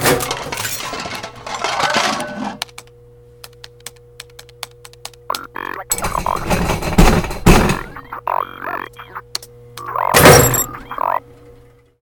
security bomb place; Original TK Sounds

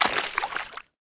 marsh step; Golden Crayon Sounds

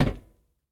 metal step; Golden Crayon Sounds

